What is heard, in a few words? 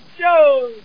OIL; PC Games.EXE November 1997; LEDWars